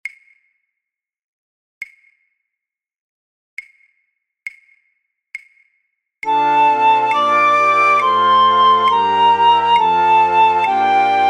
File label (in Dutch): fluitorkest Piccolo; voor oefentracks; Matthaus Passion